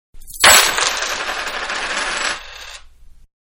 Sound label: Several Toss Onto Wood Table, Coin; Money; Manhattan Production Music